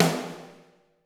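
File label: snare drum, Drums; Bob Clearmountain Drums I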